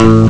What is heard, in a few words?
bass guitar; Amiga Soundtracker Sample Packs